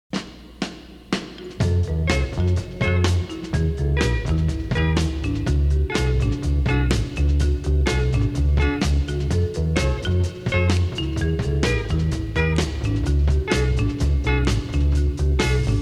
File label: music